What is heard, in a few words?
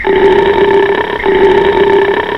belch